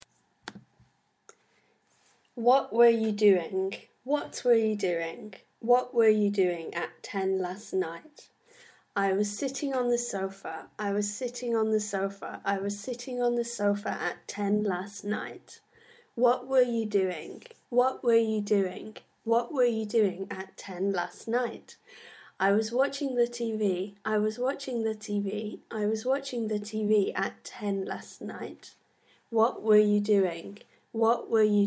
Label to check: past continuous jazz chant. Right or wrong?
Right